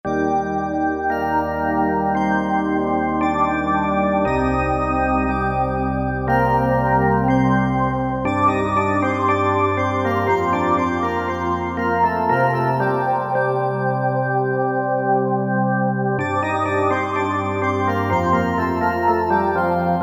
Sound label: Magic Pipe, Korg MSC-9S Organ